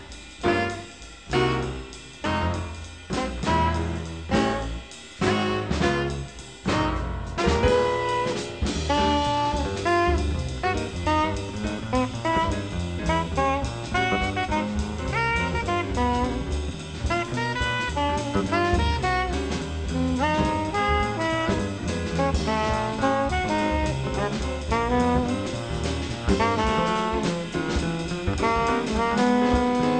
jazz

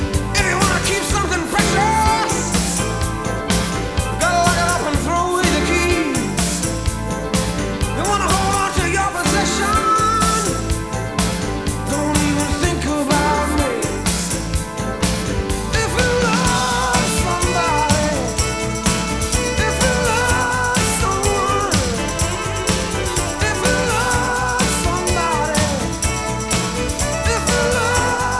rock

